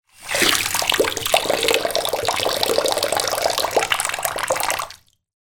household bath tap on dribble drip shallow

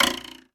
comedy ruler twang; sound effects one

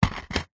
household dvd case disc inside set down carpet

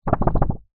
foley paper thick sheet wobble

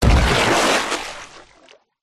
water impact hit deep surface designed; nature

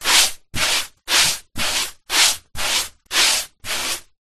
industrial paint brush strokes on wall multiple; zapsplat

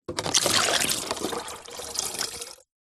household water pour into plastic funnel

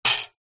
battery compartment spring ping; household

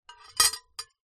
household knife place down on plate; zapsplat

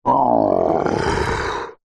horror yeti monster growl snarl; zapsplat

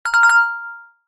multimedia alert bell glassy

